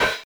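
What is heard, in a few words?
hi-hat open; USB Soundscan vol.11; Drums Dance & Groove; AKAI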